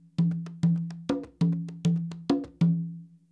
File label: tumbao, congas